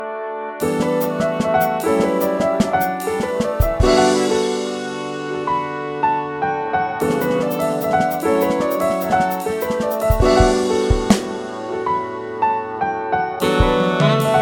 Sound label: jazz; Playing With Modern-Style Jazz Drums